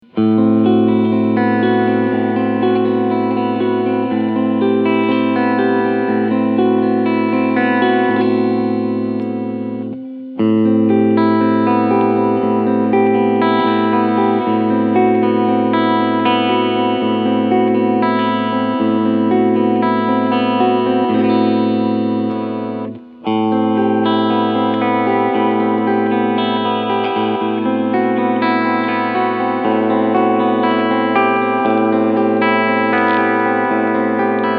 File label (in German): E Gitarre Cleansounds SC Mode Position 1 3; Dean Guitars Exile Select